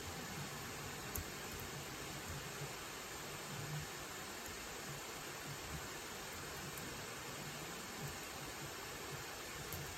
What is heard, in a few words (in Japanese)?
ガレリア ノート 騒音